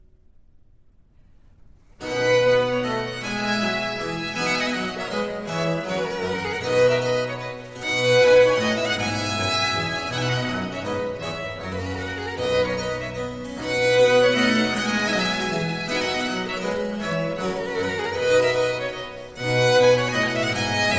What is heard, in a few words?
Menuet, 2 Violins And Continuo; LWV 35; Trios Pour Le Coucher Du Roi For 2 Violins And Continuo